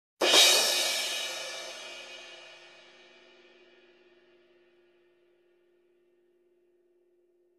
Two crash hits almost simultaneously

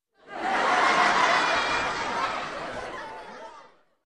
Audience Laughing Distant

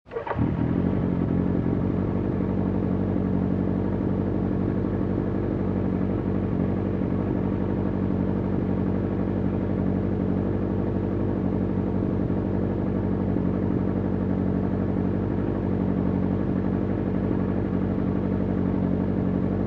Bike engine running dampened

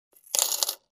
Small coins put in cash register

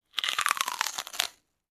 Fruit Bite

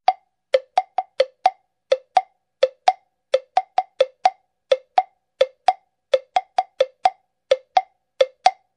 Western woodblock horse riding rhythm

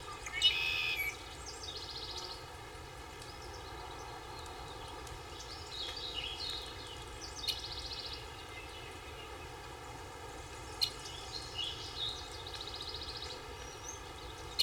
Forest with Birds and Insects

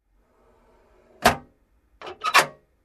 Closing and locking small metal compartment

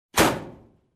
Steel Door close slam

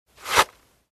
Strike out sound on paper

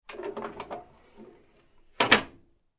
Closing compartment

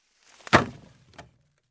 Closing small compartment door

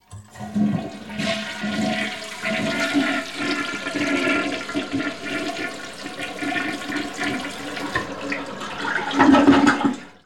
Toilet Flush

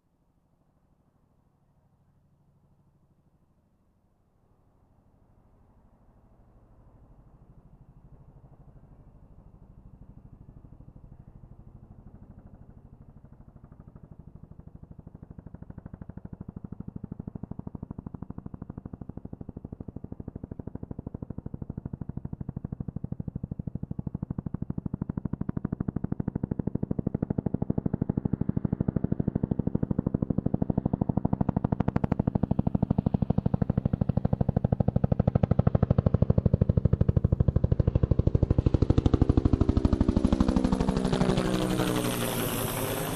Big Helicopter fly over

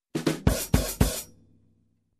Funky Drum upbeat

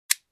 Gun Click

